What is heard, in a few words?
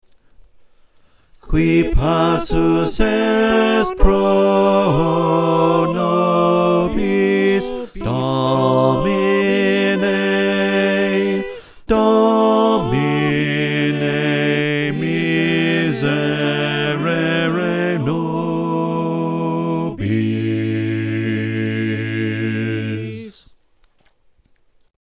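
bass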